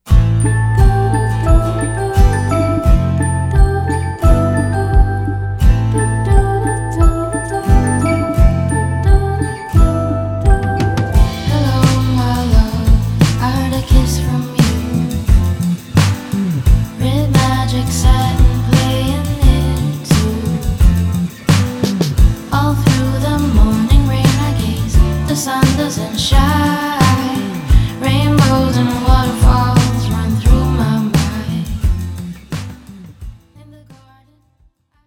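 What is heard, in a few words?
sounds of love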